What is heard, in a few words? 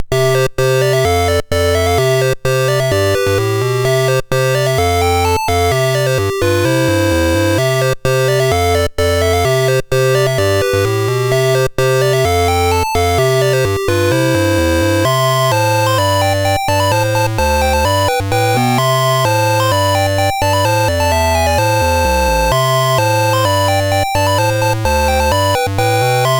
Dragonworld sid MOS6581R4